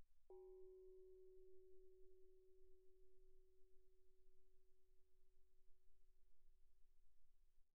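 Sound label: thai gong Gb4 pianissimo, Percussion gongs & tamtams; thaigongs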